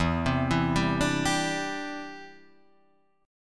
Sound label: D 7th Suspended 2nd Suspended 4th E